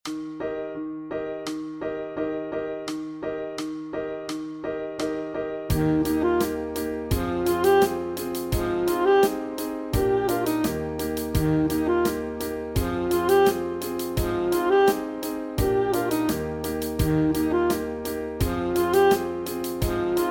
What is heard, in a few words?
Sax Study